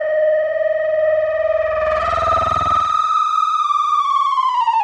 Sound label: Feed chorused, Feedback FX; 99Sounds; Dark Engine